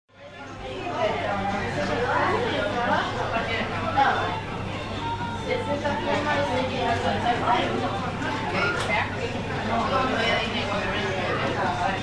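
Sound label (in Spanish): bares mcdonalds peatonal; Rosario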